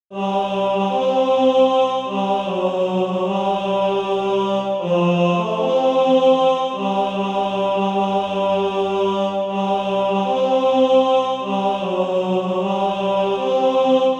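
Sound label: tenor, Practise Music; SATB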